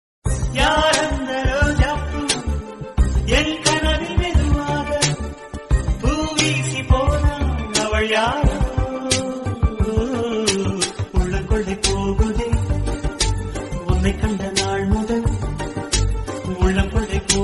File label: tamil love romantic song